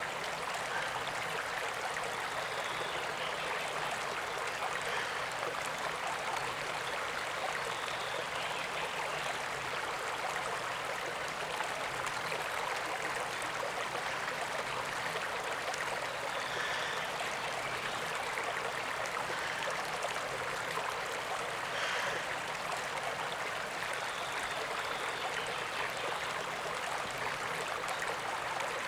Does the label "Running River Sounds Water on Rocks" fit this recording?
Yes